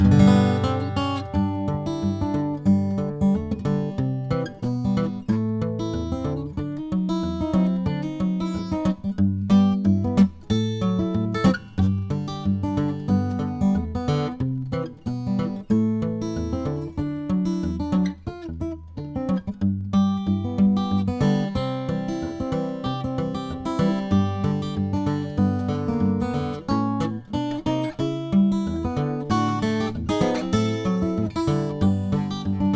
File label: Gibson J-35; 1939; James E Wood